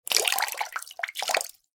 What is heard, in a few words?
water pour shallow, Water Poured Into Shallow Water; Pouring; Water Poured Into Shallow Water 5; Objects; McKinney Sound